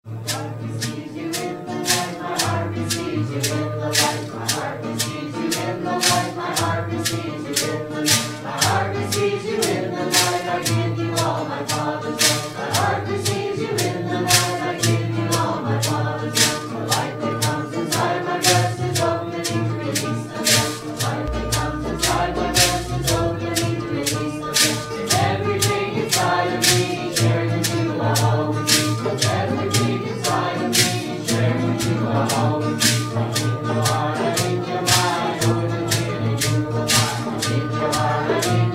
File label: Healing Song